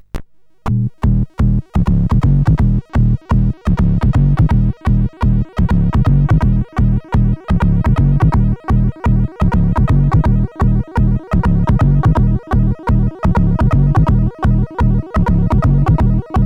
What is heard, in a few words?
sid, CSG8580